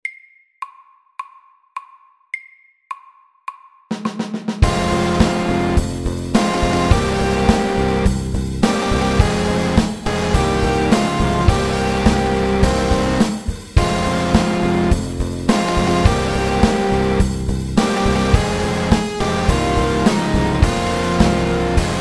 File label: Triplet metal MIDDELS